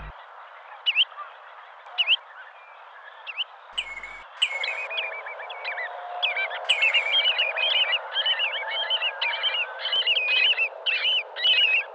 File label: redshanks